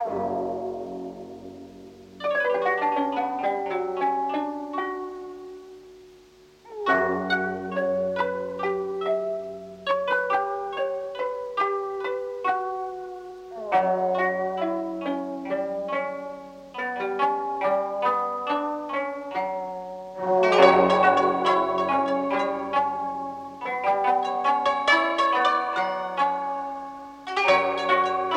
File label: Cassette Plucks; Kontakt 5